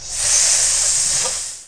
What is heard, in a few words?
snake; McDonaldland - Silly Games; McDonald's; treasure